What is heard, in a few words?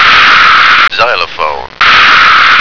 xylophone